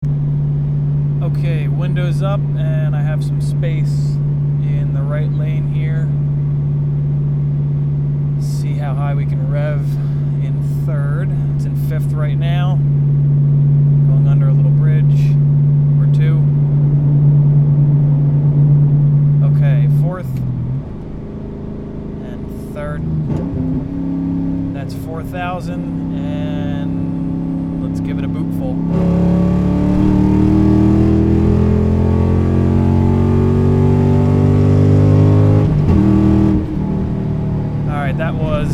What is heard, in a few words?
4k - 6k windows up, Cobra Factory Headers